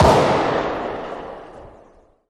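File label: mp7 distant, gun; arccw go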